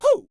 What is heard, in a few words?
perc voice, Roland MC-909